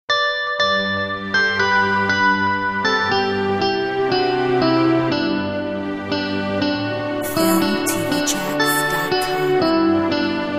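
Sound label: Away in the manger, 120 electric guitar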